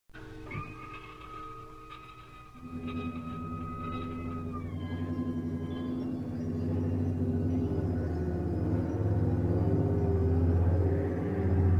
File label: phaser cannon